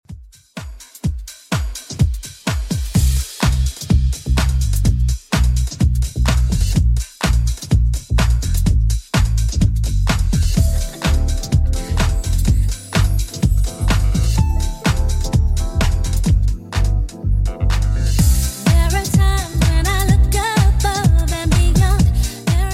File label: 90s Redrum 126bpm